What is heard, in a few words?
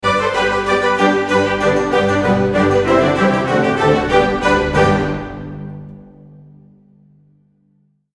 Baroque Coffee House Sting